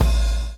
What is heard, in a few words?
BLOW; Spectrasonics - Backbeat